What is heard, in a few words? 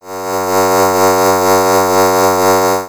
clock alarm sound instrumental